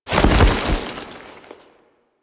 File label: Foley Land; Warframe; Kahl